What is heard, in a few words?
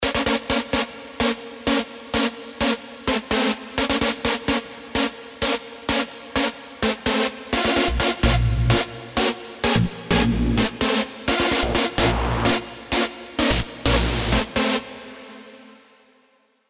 Yoji, Serum